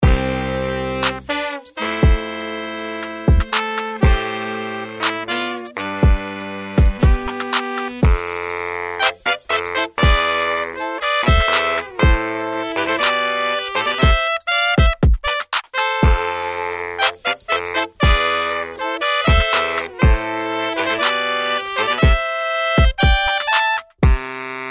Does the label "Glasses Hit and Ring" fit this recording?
No